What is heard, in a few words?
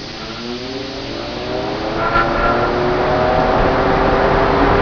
Fan starting